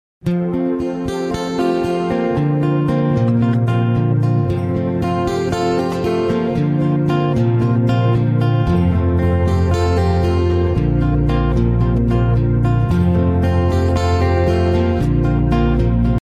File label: guitar music ringtone